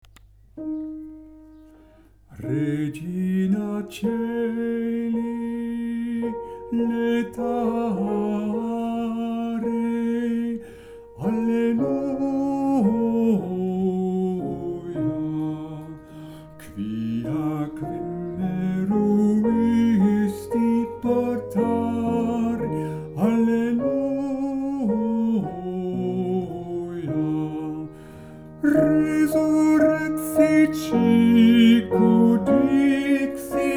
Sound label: sop